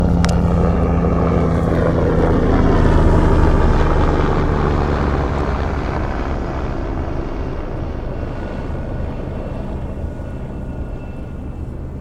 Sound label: Hellicopter At The Beach